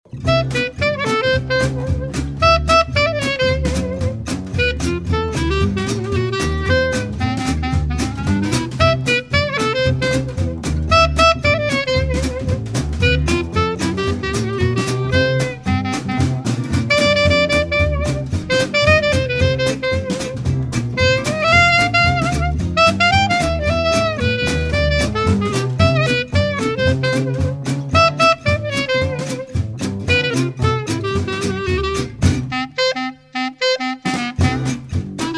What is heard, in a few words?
clar